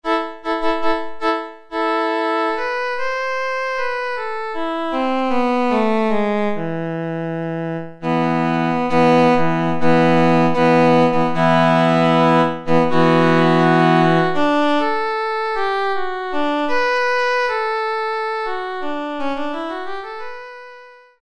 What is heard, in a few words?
Cello, Roland D-110; Factory Patches